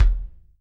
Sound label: Kick Basket Yamaha soft